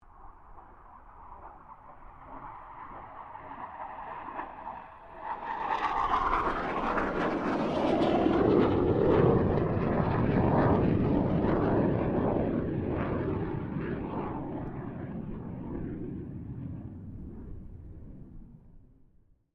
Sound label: 1997 BAE HAWK 127 PASS; Airplanes